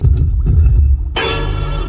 126 bpm dumpster dub

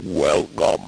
lofi sega sample; 8 BIT; Lofi Sega Samples

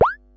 silly elec fx

